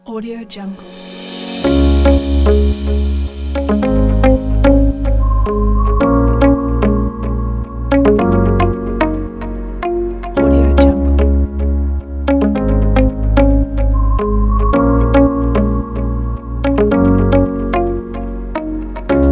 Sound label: uplift commercial corporate